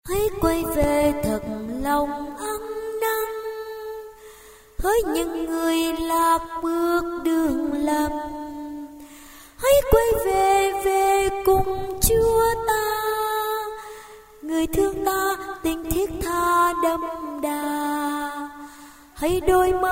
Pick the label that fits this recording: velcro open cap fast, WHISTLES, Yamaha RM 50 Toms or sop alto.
sop alto